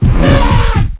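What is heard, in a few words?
ELEPHANT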